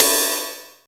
Oberheim DX Ride

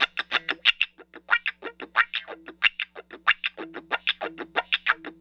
Call And Response Guitar, 70s Analog Funk; Sonic Foundry (Sony Creative Software); What it Is!

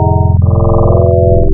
corus, wavetables, Classic Synths

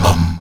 VOX MUM E 2B, Afro Chants; Roland LCDP11 Africa VOL-1; Stacc Mums

